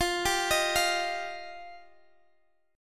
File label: F 7th Suspended 2nd F down Guitar Drop D